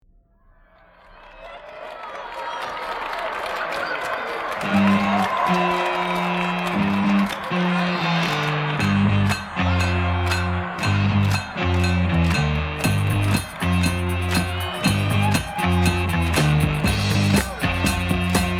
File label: polka